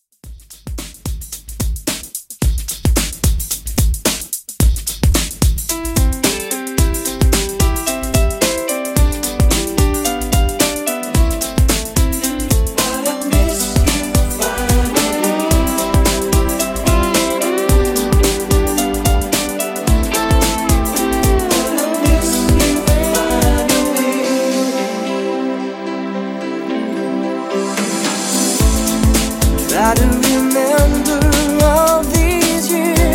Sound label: 90s extended mix 110 bpm